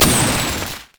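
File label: fireworks missile barrage; Fireworks Corki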